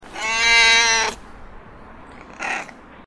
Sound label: cat meowing sound